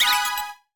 PLAY TRAMPOLIN

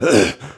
Wheeze; Elevation

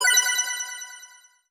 Tutorial Success; disney star smash android ios mobile gamerip 2020

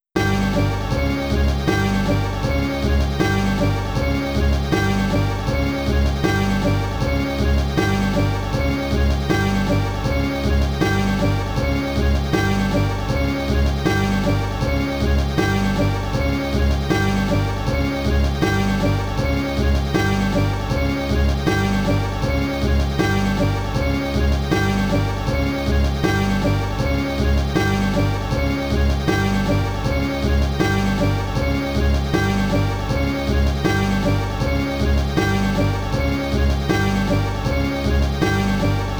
Pre-Launch 2, pinball; Scared Stiff; Bally Pinball

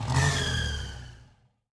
Goblin Uber Charge

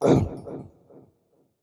Attack; Going Under

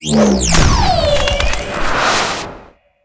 Winged Boots Cut Scene; MagicItem WingedBoots SFX CutScene 01

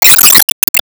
Enemy Pirate Anchor Hit

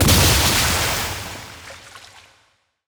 Enemy Evolution Active Hit; disney star smash android ios mobile gamerip 2020

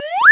Slip Up; neighbours from hell console edition; Xbox

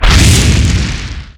Enemy Bigflame Sizeup

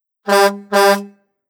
Hoover dam Horn; Hooverdam1 Horn1

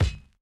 Bounce Sword Large; Going Under

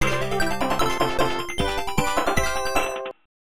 Big Explosion; Bubblen Roulette

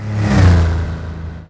Menu click off

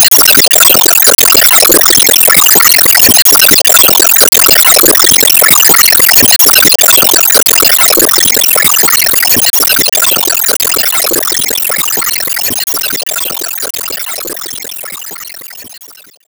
Enemy Big flame Active Loop

